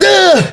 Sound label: pain